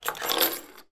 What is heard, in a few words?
household key car electronic slide across sideboard